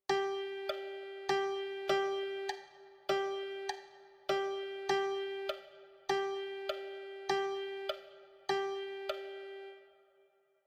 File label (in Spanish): percusion